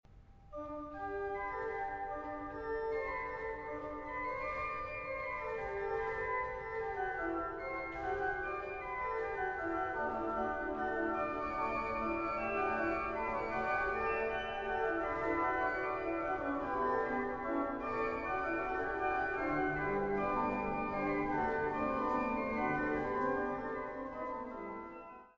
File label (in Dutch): Orgelcompositie Psalm